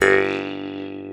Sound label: MT G, JHARP G B; Xsample VOL-9